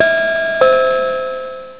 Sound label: ding dong